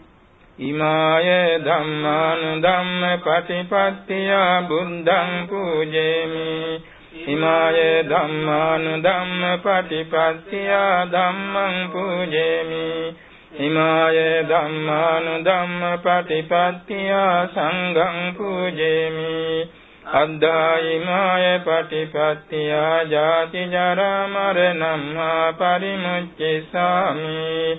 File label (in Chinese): 功德回向, 课诵; 晚课; 龙树林; 龙树禅林; TheravadaDatabase